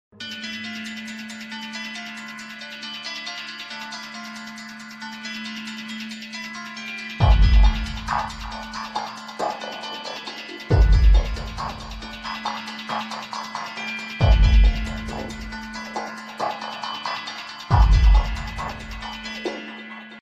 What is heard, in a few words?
hollywood movie tone ringtone; saw